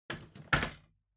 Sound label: Vacuum Cleaner Put